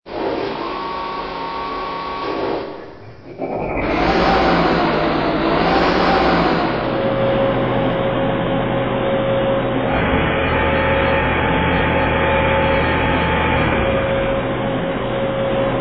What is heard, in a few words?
M62 Stereo